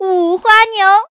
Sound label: cow